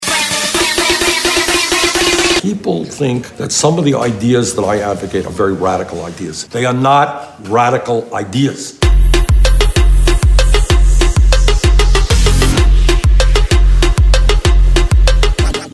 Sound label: Bass Drop; Ring Tone